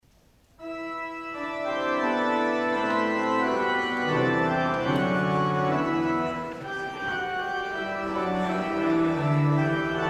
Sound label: Postlude on 'Deo Gracias', organ; (Masses IX and X)